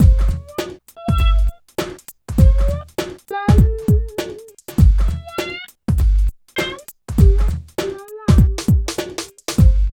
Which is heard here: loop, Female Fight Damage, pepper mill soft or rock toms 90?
loop